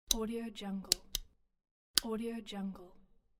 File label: switcher